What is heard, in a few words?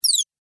Multimedia Squeaky Beeper; Beep Sound Effects